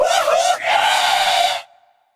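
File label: Banshee voice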